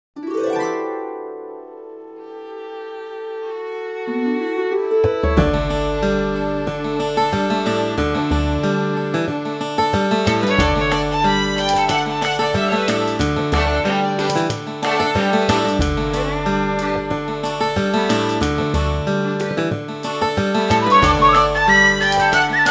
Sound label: celtic instruments; big fish audio